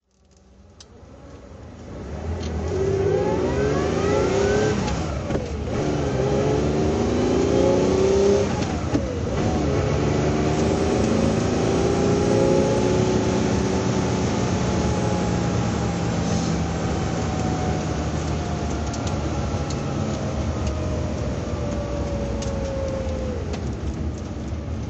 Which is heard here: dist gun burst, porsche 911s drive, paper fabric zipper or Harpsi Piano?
porsche 911s drive